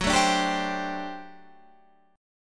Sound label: G Major 7th Suspended 2nd Suspended 4th F sharp